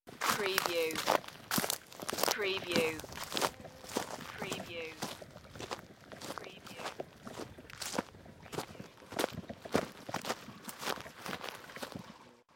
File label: Footsteps on Gravel and Leaves